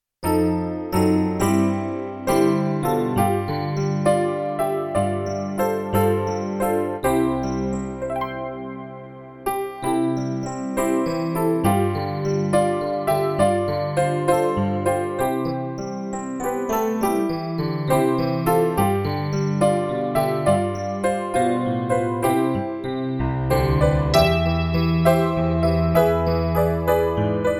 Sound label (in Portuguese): playback hino